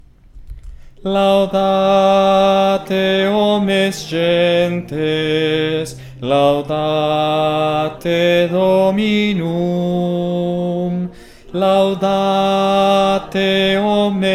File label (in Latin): soprano oitavado